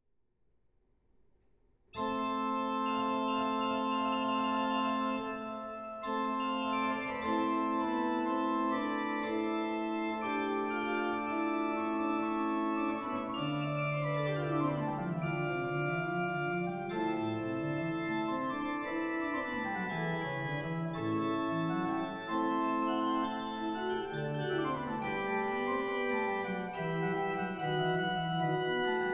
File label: Toccata for organ; Venetian Vespers